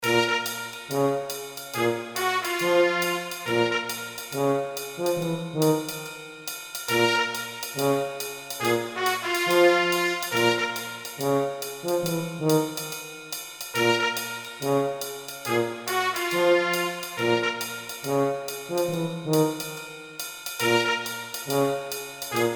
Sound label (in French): orchestre trompette tuba